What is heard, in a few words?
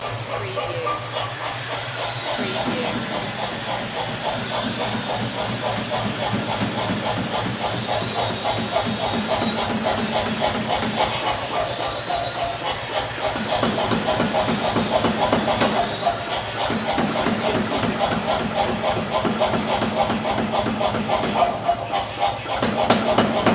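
Blacksmith Ambience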